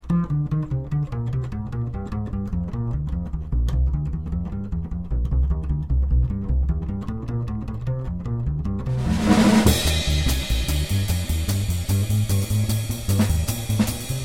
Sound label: minus piano